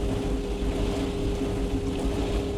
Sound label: machinery drone